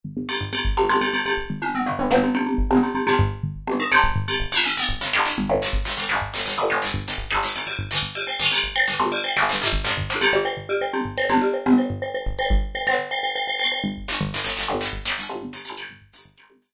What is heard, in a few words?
X-Mod Perc., Korg microKORG; Factory Voices